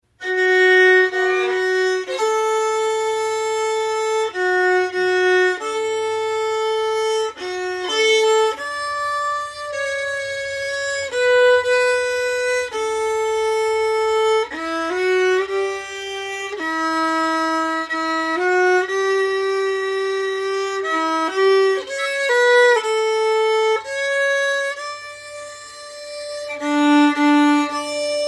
Lullaby Violin; lament; sad tunes; Brahms

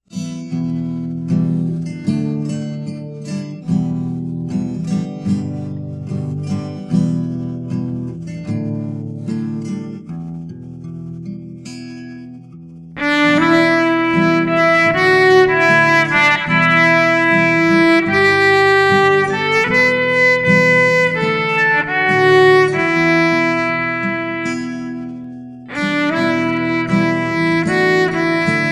Blow the candles out with backing track

